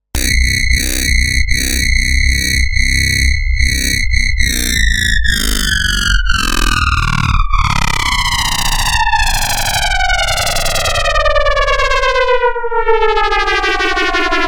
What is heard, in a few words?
bass preset; fernando tanzo